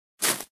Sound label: Single footstep on leaves